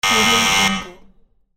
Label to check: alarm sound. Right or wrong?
Right